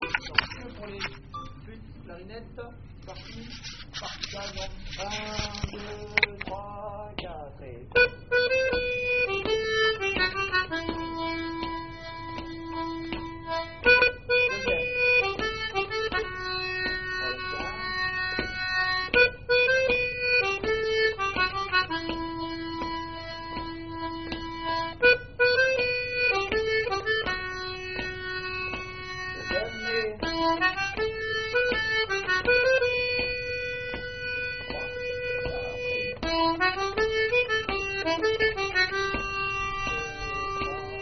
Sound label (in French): Andro mélodie partie calme flûtes clarinettes